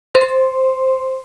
CLOCK

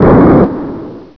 BANG

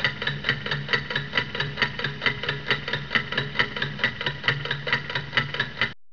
CLOCK

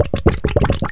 BUBB POT; JOHNNY

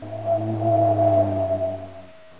WIND

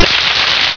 APPLAUS

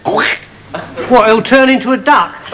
DUCK

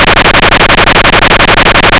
RATATAT

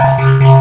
robotic

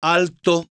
ALTO

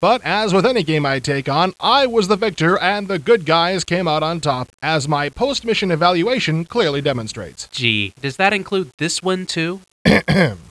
HARP

